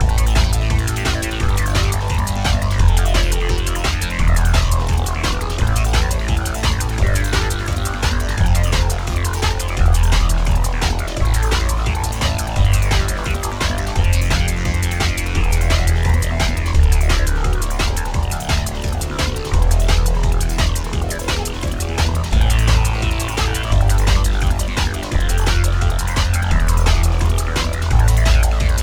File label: Looping, action